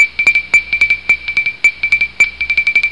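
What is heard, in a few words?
NEWS FLASH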